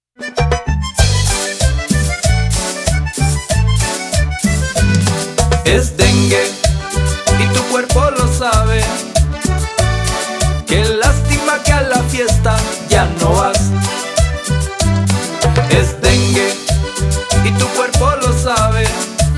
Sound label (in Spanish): DENGUE JINGLE CUMBIA, JINGLE TECNO ESPAÑOL; verano 2025; Spotradio